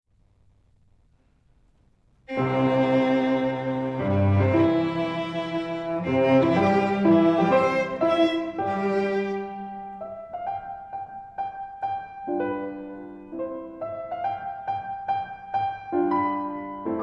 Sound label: Piano Trio in C Major KV 548 I Allegro; Mozart; Furuya Trio